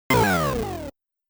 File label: Fall Sound; Beecon; Motos